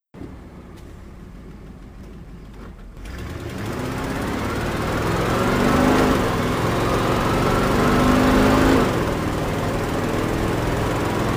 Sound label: IH Loadstar Engine Sounds